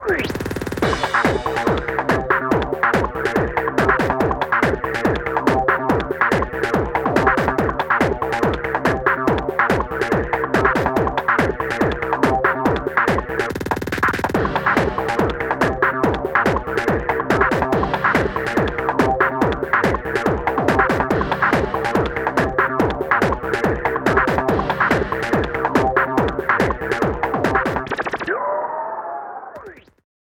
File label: BoomBox Track loop